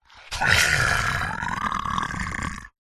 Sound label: horror monster growl forced agressive